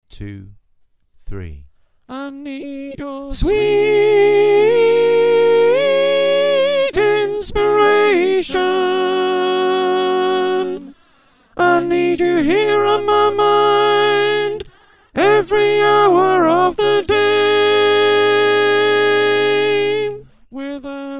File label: Soprano, choir